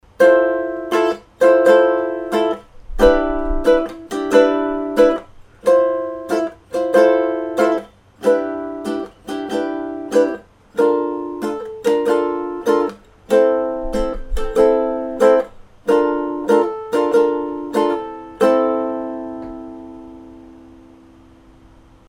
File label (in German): Ukulele mittle Entfernung ohne Popschutz; Apogee